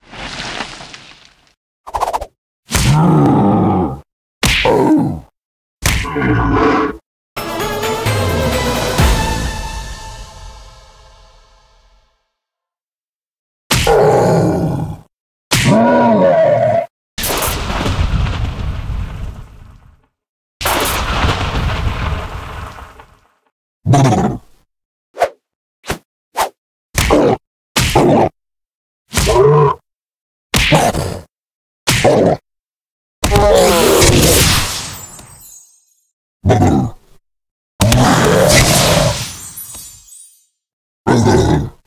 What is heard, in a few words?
freespin; wildworlds